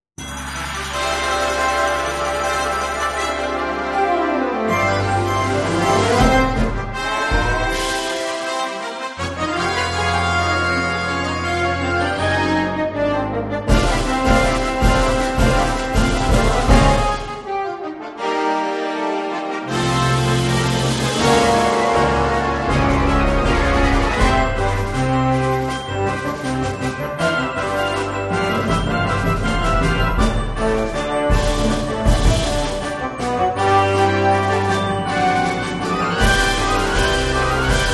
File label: Fanfare